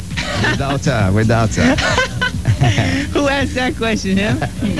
laughter

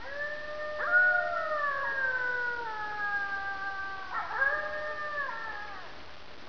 wolf

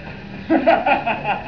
laugh

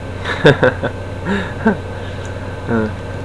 triplaugh

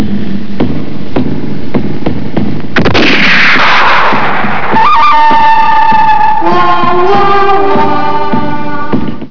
wawawa

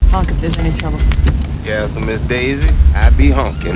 honk

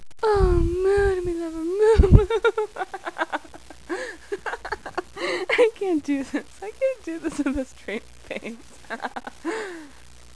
moo

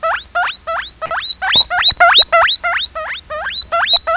cavy

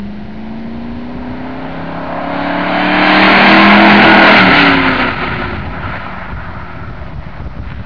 drive by

